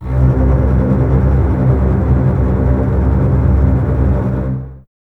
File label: String Ensembles, Basses, Tremolo, C; Miroslav Vitous; 90 s Sample CDs